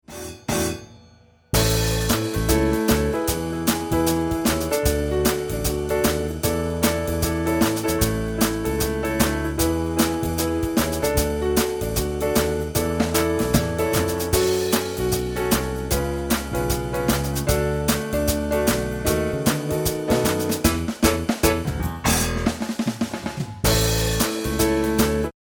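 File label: pop song drums + keyboard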